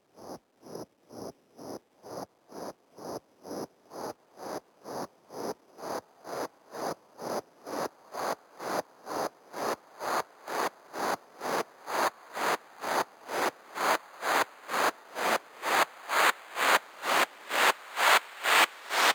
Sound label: Sweeps Long